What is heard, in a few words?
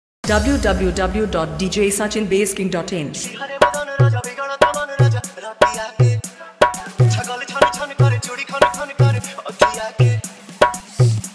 New Trending Viral Bhojpuri Dj Remix Song Hard Dholki